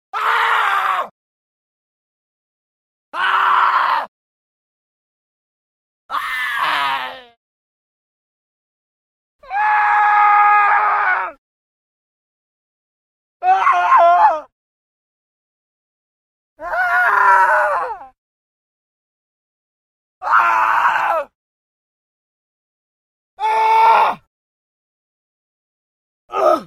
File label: Death Screams 10 Male Scream; Hollywood Edge Historical Series; War Horses Armies & Weapons